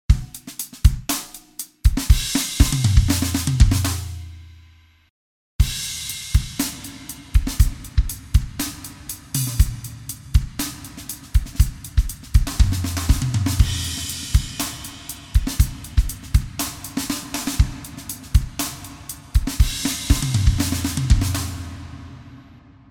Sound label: Drums, Reverb; AtomicReverb